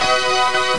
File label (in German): orgel